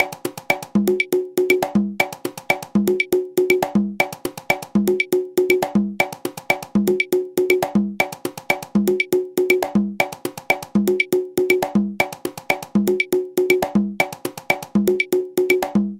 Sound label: dengue conga clave